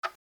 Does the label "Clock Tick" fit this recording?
Yes